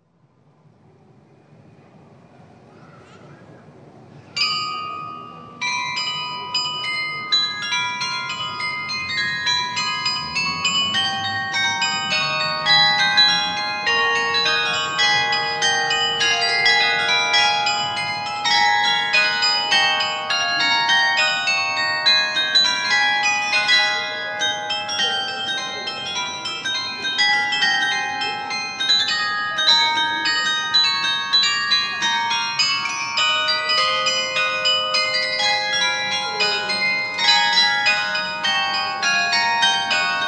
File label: carillon; Allerlei 2004-2005